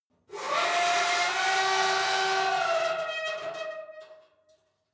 metal squeal saturated; industrie-wirtschaft

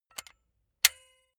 silicone gun bullet lock mechanism heavy; soundpool

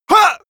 male attack, schreie; menschen

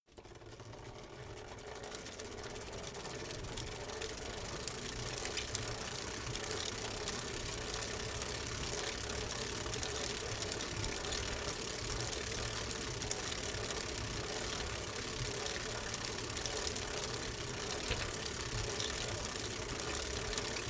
bicycle rickety pedal slow constant high gear

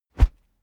whoosh wood cornered whizz bass

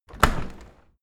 window handle open; objekte; fenster

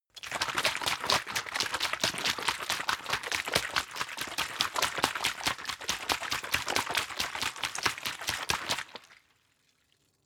bottle water shake long; gastronomie; berufe

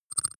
click stutter soft; maschinen; signale; soundpool

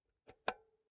insert xlr multi socket slow, elektronik; objekte

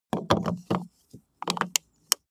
car bmw e46 exterior gas cap spin close

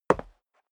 step dress boots concrete single left; Footsteps; schritte

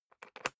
briefcase plastic latch lock key turn

